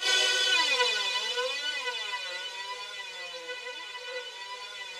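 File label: rough strings B3 left, Yamaha VP-1; 90 s Sample CDs; Music Store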